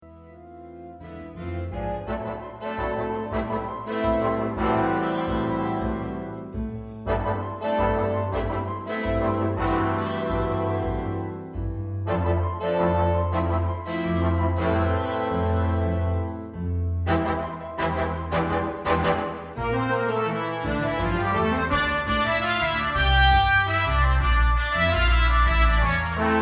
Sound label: Big Band